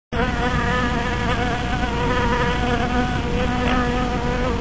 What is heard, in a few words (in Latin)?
Apis; biosfera